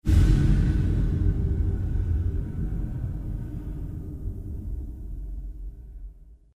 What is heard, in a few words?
created pulse reverb stereo